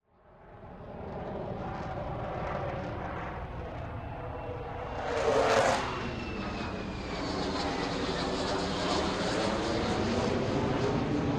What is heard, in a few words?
Jet, Fighter, CF-18, Hornet, By, Slow, Rip to Whistle; Airborne Sound; Jet Fighter Maneuvers